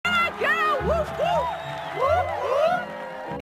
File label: woop